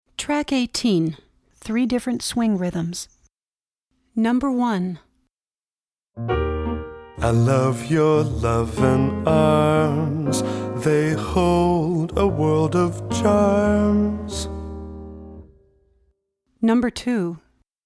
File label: Swing; Rhythmic Choices